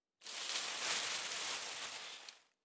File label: Bushes; We Are the Dwarves